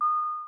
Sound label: Mid High Tone Button Click